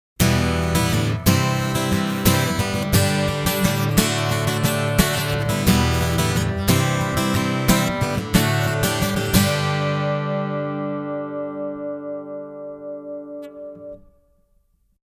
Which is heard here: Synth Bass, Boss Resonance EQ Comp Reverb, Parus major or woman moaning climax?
Boss Resonance EQ Comp Reverb